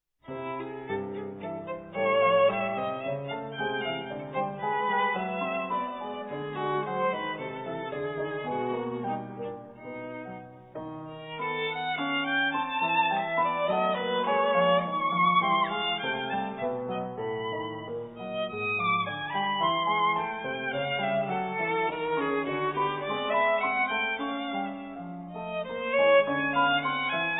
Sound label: violine